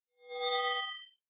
hologram appear, energie

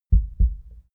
heart beat double; comedy-cartoon; koerpergeraeusche

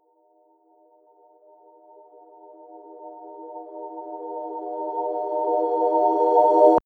magical chord reversed; risers

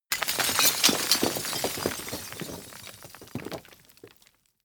roll fall; industrie wirtschaft; baugewerbe

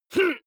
male fight groan short; comedy-cartoon; soundpool

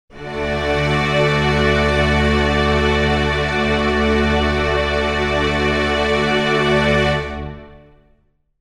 string chord d major; flaechen

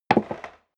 step leather shoes wood creak single stair up

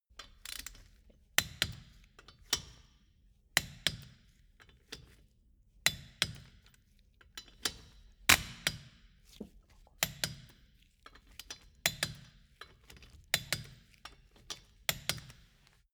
wheel change sequence screw and hammer; schrauben drehen hydraulik

